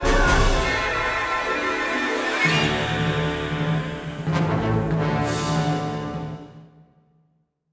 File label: battle win, music